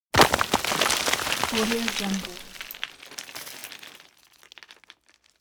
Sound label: rock fall